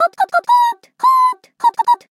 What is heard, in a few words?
Burning; SM64 CO-OP; Triple Baka Pack; Miku